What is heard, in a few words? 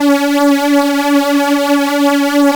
Korg PS3100 C#5; Back In Time Records; Korg Universe VOL-1